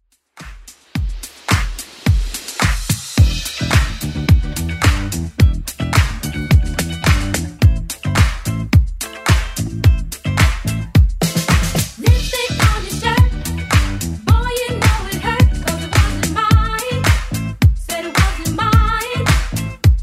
80s Club Edit 108bpm

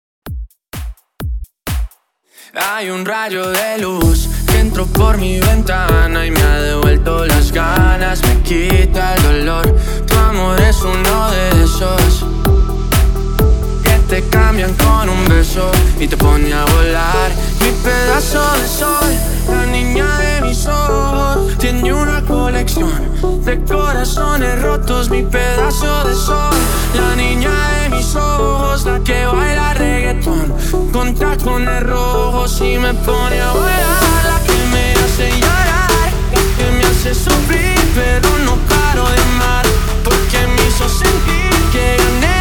128 BPM; MASHUP

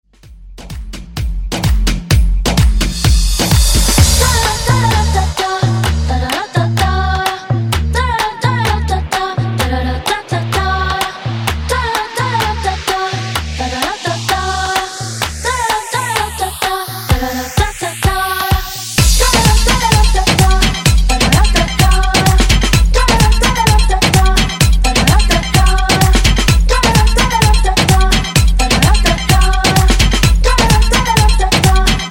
Top40 Tribal Redrum 128 Bpm Dirty

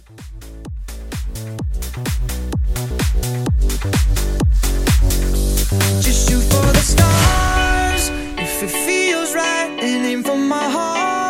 Club Hybrid Mashup

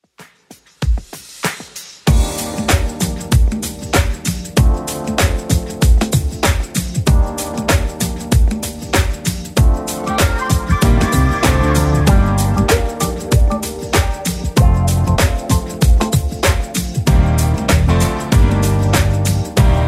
90s Redrum 96 bpm

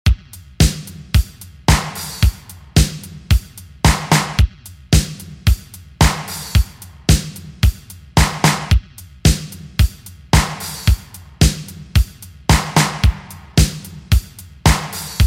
111 Bpm; Dj Intro Outro

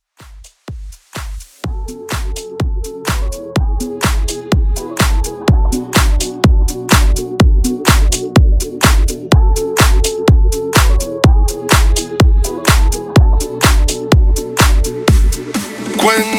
Latin Dance ReDrum 125 BPM; Top40

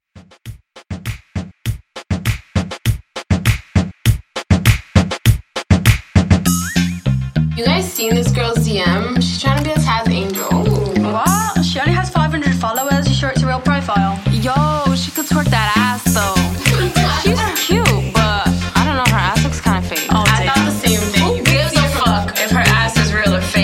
Dubstep Trap Redrum; Remixfreakz

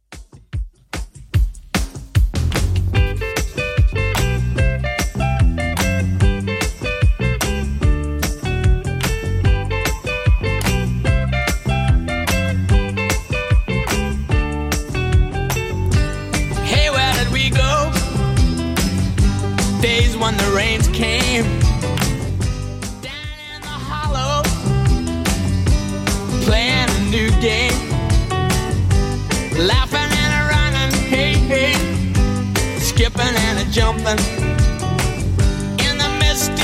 70s Redrum 148bpm

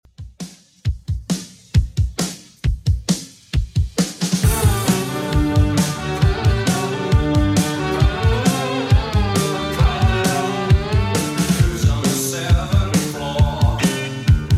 80s 134 bpm; Redrum

